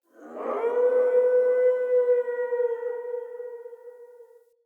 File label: Wolf Cub Landscape Howl; Vocals; Animals